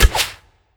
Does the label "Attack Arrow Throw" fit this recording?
Yes